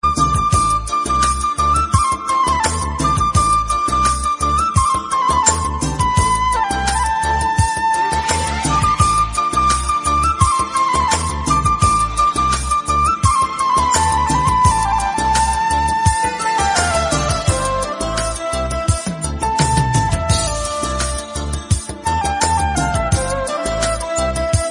Touching Bansuri; ringtones

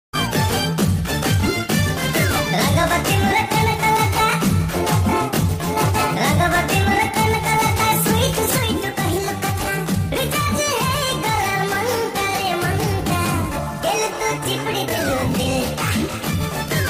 New Odia Song Ringtone

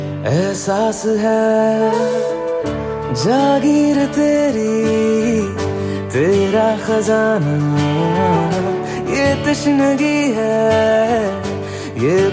Bollywood Movie Song Ringtone; Ms Dhoni

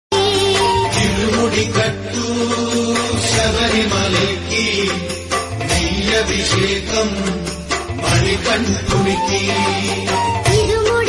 Ayyappan Tamil

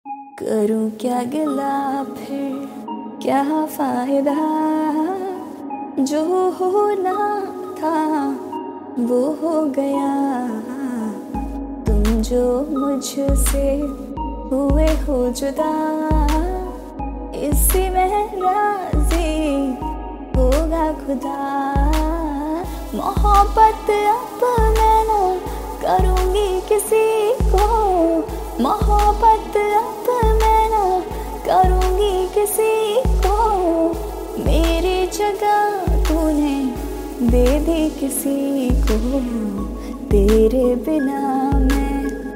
Female Version Ringtone

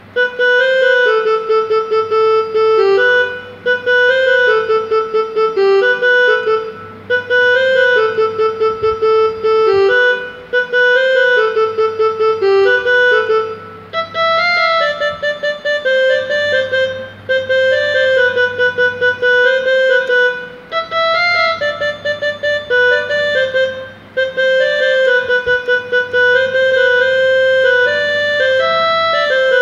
Ringtone Instrumental

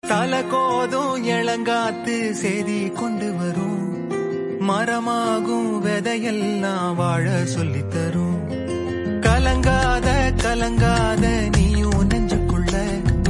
Tamil Song

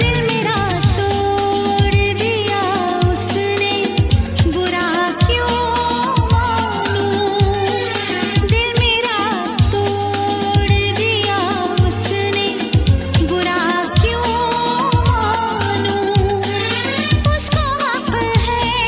Most Sad Hindi Female; ringtones

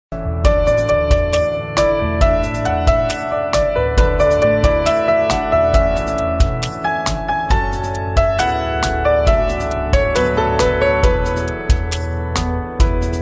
Instumental Ringtone

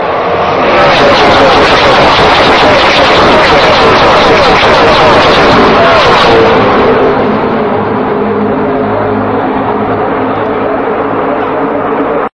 Nascar Pass Sound Ringtone; ringtones

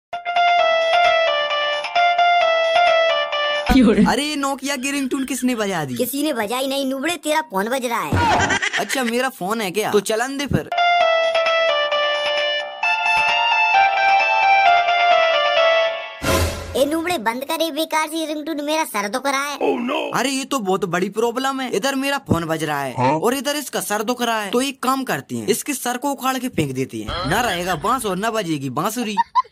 Nokia Ringtone

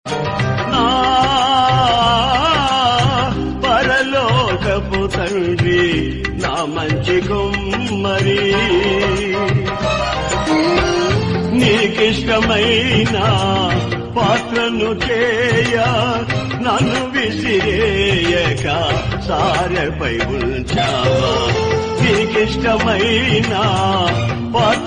Telugu Song Ringtone

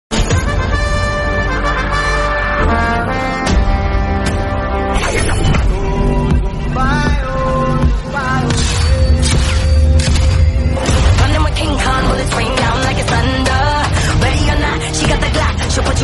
Theme Instrumental; ringtones; Jawan; Prevue

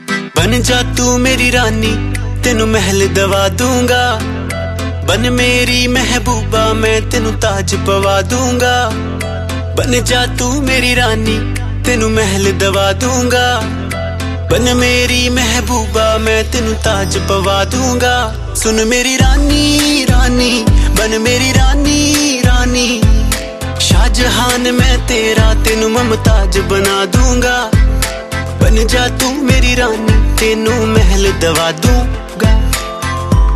Punjabi Ringtone

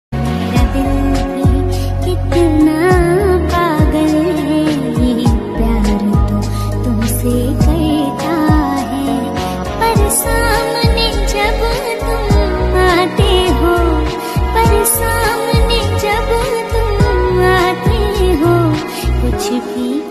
Female Song Ringtone

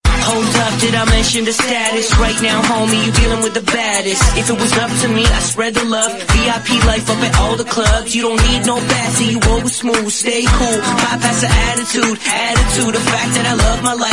English Attitude Ringtone; ringtones

